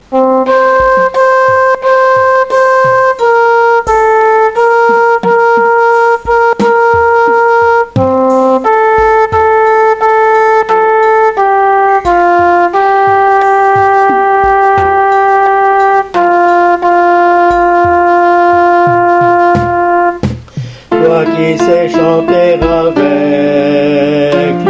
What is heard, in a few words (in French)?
La terre est si belle alti et piano